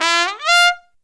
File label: TRUMPET FX, Phantom Horns; Zero-G